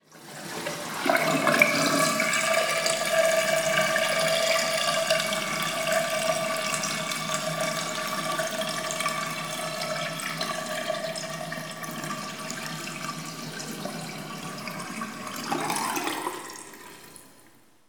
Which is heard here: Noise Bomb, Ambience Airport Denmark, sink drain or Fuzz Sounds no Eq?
sink drain